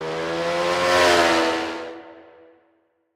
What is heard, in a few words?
Motoboy Start